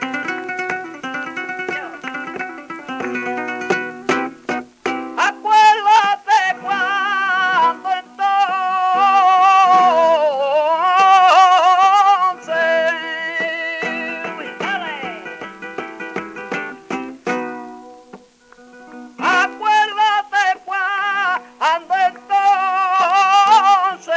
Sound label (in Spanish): Solea, flamenco